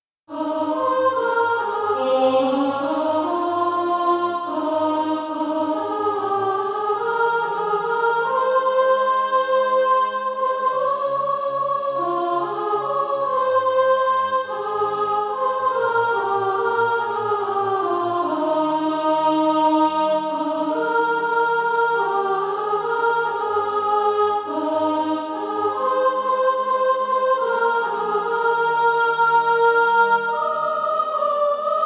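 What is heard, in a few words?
soprano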